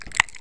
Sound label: Mouth Open